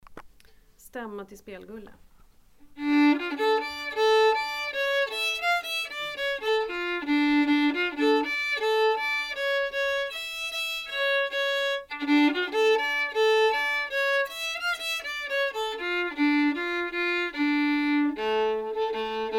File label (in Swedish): Stämma